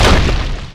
attack impact; Dota 2; Tiny

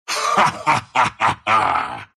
laugh, ru; Dota 2

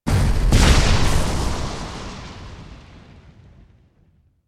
Thunderbolt Lightning Bolt; Righteous

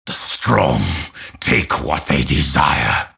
deny pain; Grimstroke

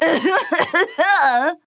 Clear Throat; Skye

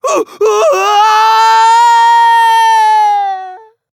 Falling; Demon

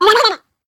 pain; Awesomenauts

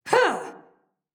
Mage Grunt Slow; Realm Royale; Seraphim

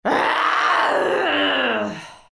grunt; Legcom; zh; Dota 2

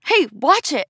Taking Damage; Technomancer; Realm Royale

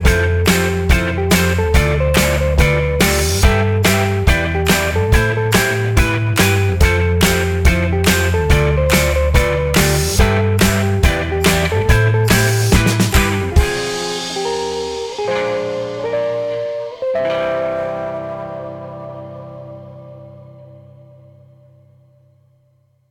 MUS Recall

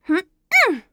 Grunt Slow; Mage; Starburst Sorceress; Realm Royale

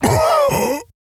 Death; Paladins; Raum

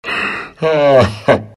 dragon knight laugh

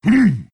pain; Tiny

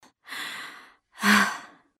Breathing; overwatch

